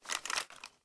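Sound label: inspect movement, weapons; Valorant; spectre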